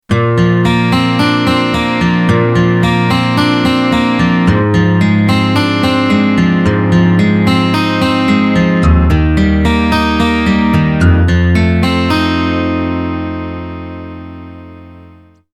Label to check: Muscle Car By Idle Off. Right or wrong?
Wrong